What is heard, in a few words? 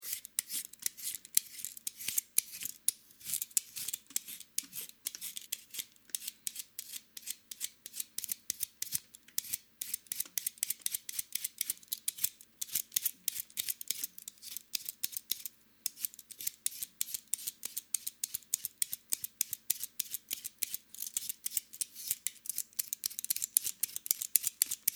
Peeling Vegatables, Peeling sound of preparing vegatables.; Food; Household